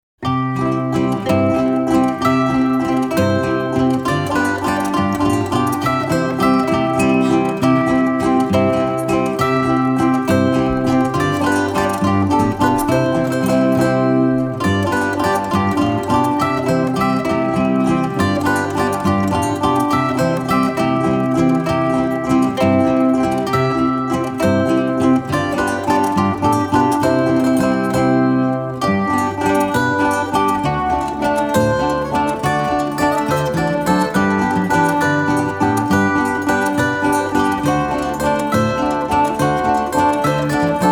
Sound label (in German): Zither Gitarre Duo